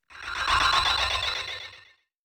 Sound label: robot death; enemydeath